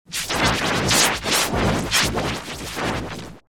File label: ultra flanger